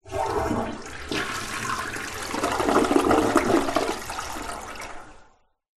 Toilet Flush

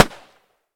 gun tikka t3 battue 308 Swpph Shot Strong

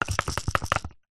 pepper mill wooden large shake; household

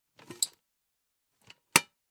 Pedal Bin Open Close; noise creations

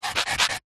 stone small scrape on concrete paving slab; foley

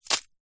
wet sand handful throw down onto wet sand

